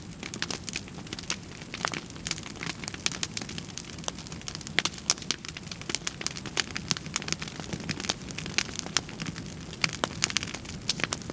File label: nature fire